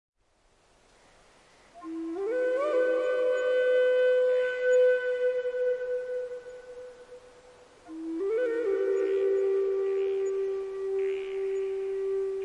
Baby's Lullaby, Flute for Sleep & Relaxation with Sounds of Nature